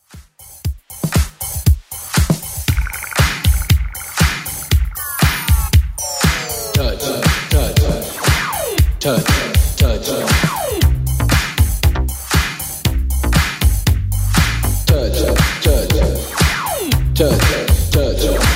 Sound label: 80s Club 118 bpm CLEAN